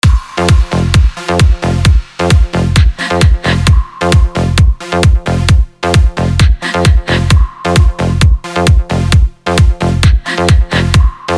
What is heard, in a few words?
Remix